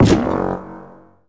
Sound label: Barrel; Jonah A VeggieTales Game